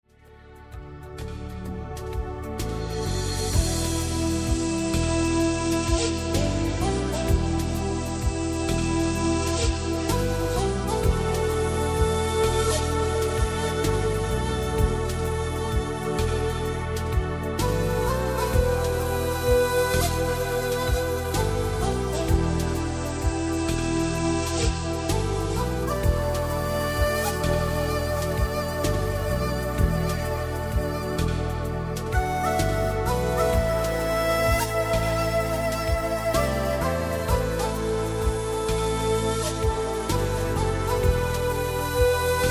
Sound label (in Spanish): Musica para relajarse y sentirse bien